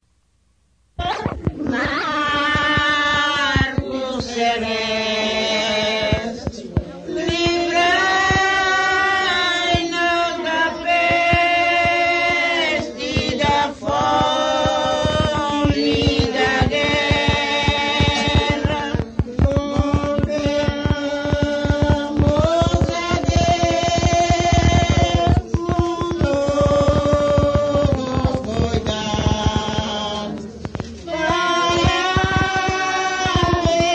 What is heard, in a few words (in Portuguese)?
ladainha reza cantada